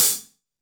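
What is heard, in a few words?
closed hi hat, Roland BK-5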